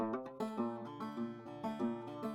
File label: Banjo